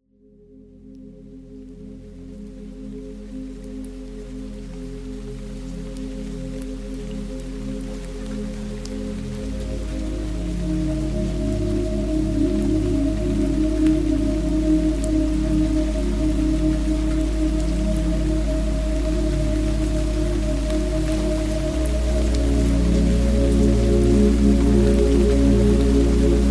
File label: rain; Better Sleep Frequencies; 528 Hz Often called the "Miracle Frequency"; 528mhz plus rain